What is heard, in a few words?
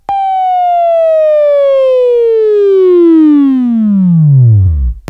Buzz; Sweeping Training